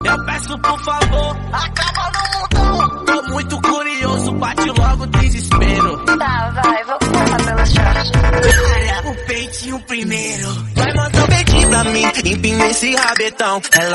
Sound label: remix bgm